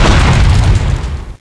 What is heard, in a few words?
molotov explosion